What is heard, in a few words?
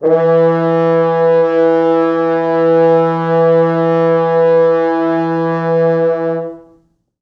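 4 FrHorns SUS, French Horns; East West; Quantum Leap Horns Sax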